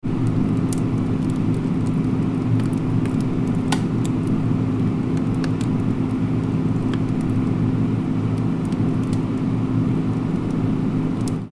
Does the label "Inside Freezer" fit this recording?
Yes